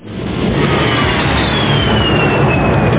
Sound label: JET; TacOps Demo